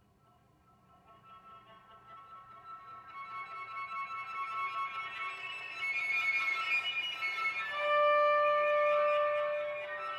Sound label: Dolce, leggiero, libero, Solo Cello